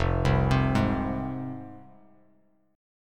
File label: F Minor F down Bass Standard; Fm arpeggiated